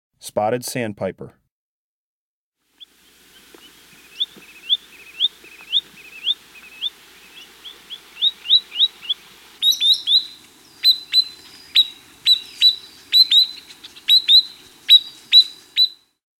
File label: Spotted Sandpiper